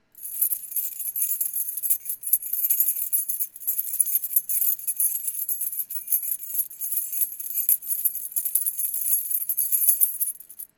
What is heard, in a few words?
Key Jinglin